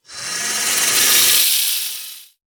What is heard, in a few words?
Emperor Cobra Enter Play